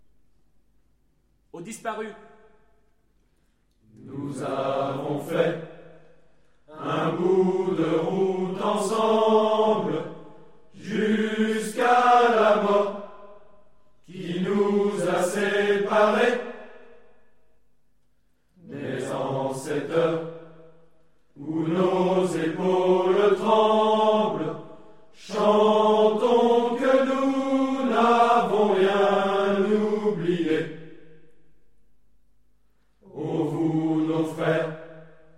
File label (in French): Hymne couplet; disparus; hymne des gadzarts; Couplet École